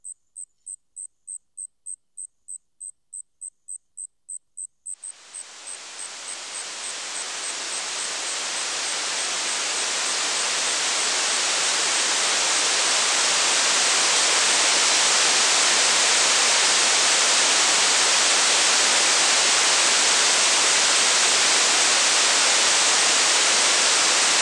higher pitched cricket and masking sound

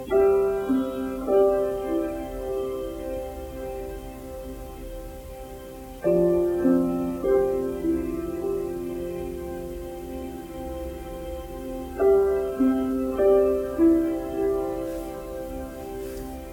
piano and lullaby

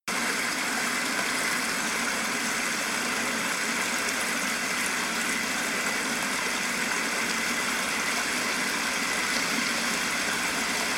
Meadow

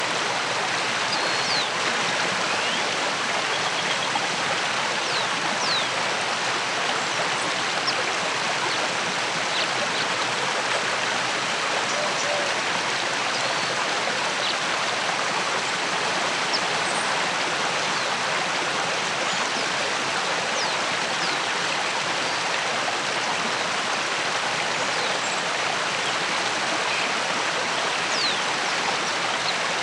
aviary headphones

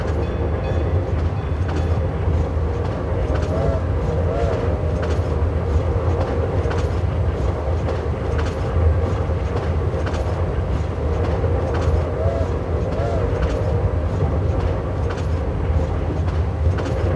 trainride headphones

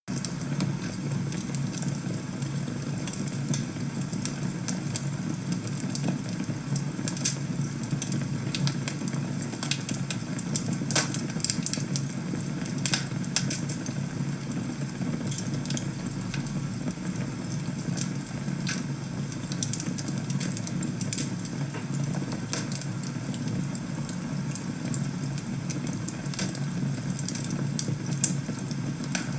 fireplace

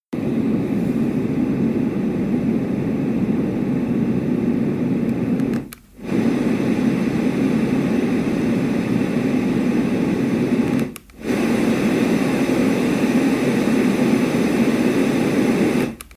white noise samples; Lectrofan Classic 3

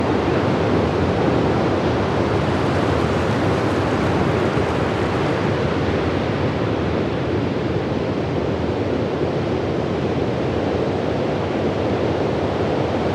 ocean sound

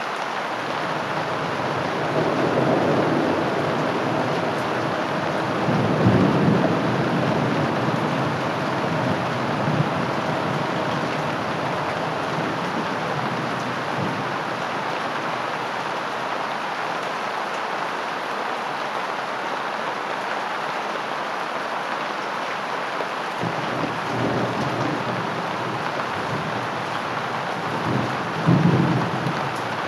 rainfall; headpones

